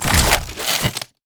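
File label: swap guitar; LoL; jinx skin01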